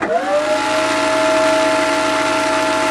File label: flakgun spin up, weapons; tf2classic